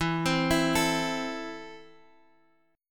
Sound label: E Suspended 4th Sharp 5th E, down, Guitar, Half Step Down